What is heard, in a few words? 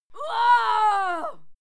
female fight scream